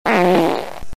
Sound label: fart; Misfire Sven Co-op Chat Sounds